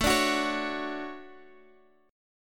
D Major 7th, chord

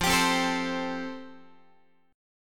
F Major Add 9th, Guitar; Fadd9 strummed; JGuitar

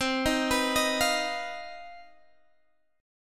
C Minor Major 7th Double Flat 5th chord; CmM7bb5; arpeggiated

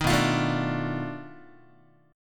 B 7th Sharp 9th Flat 5th, down, Guitar; B7#9b5 strummed; 6,7,8,7,6,x; JGuitar

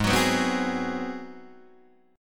Ab 7th Sharp 9th Guitar Open D, chord

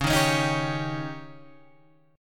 Db Suspended 2nd Flat 5th, Guitar, Open G

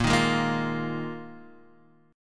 Bb 7th Suspended 4th Bb down Guitar Standard; strummed

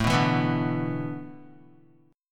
A Suspended 2nd Suspended 4th, Guitar; strummed; guitar chord

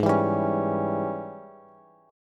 Ab Major 7th Suspended 4th Sharp 5th Ab down Bass; strummed; JGuitar

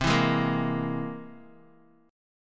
C Augmented 7th, Guitar, Open D

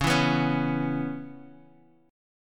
C sharp Minor 7th; x,x,x,6,4,6,3,x

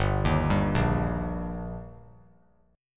G Minor Sharp 5th G, chord; arpeggiated; JGuitar

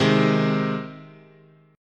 C Minor Sharp 5th, guitar chord

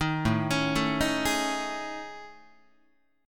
A Major 7th Flat 5th, down Guitar; AM7b5; arpeggiated

